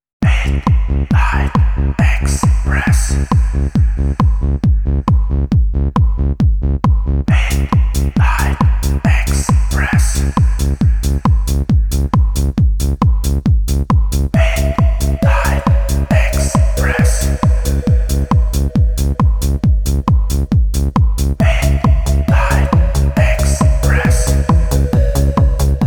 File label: Trance